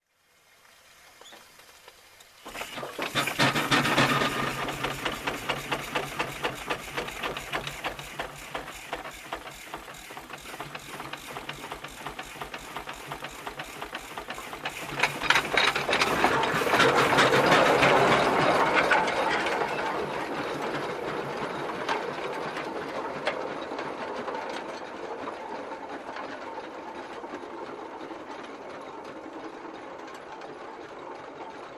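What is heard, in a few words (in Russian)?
звуки парового трактора трактор заводится и уезжает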